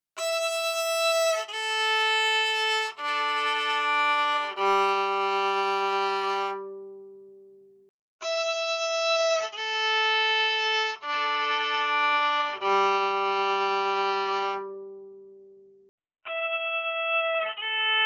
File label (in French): violon voix HD